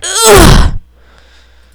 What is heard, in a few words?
groan